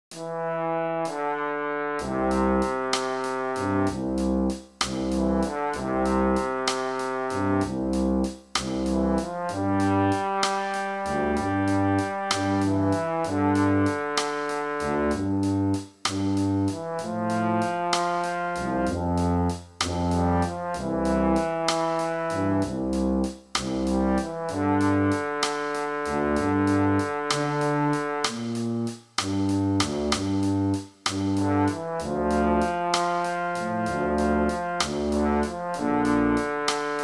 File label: MIDI Drums